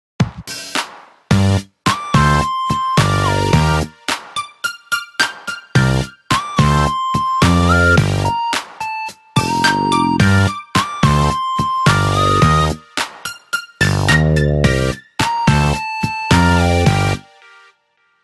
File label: Rain shower, Ringtones; Nokia; 2855